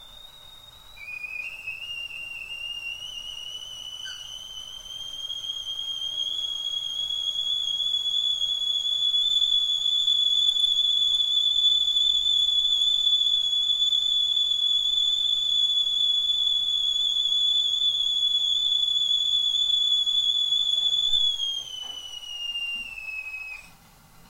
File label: Tea Kettle Whistle